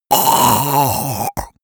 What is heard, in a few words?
monster choking zombie; comedy-cartoon; soundpool